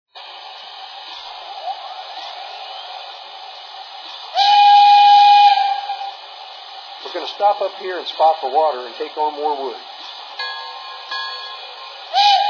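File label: ten wheel, locomotive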